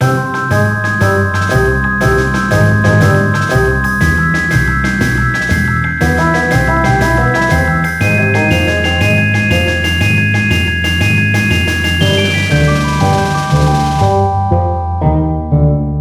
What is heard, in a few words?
Magical Forest, reveal music; Spanish